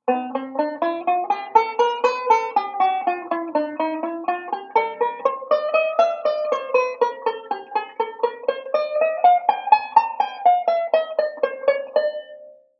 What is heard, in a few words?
Connected 3rds slow, banjo; Beyond Chord Melody Volume II